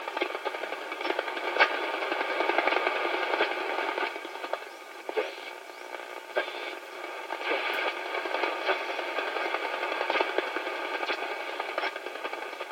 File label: radio